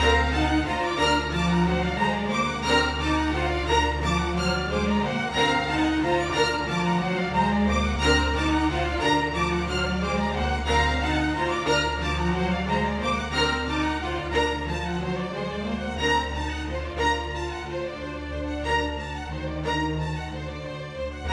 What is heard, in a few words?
Strings; Sonic Foundry (Sony Creative Software); Orchestral VOL-2; Modern 07